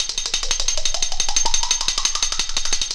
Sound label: CHINA SPOON; Zero G - Ethnic; CHINA SPOONS